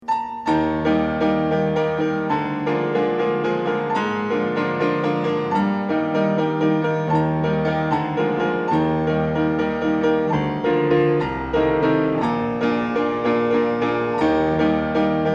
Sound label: Variations For Piano